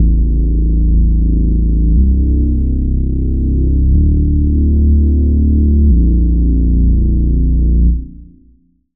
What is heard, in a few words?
Reese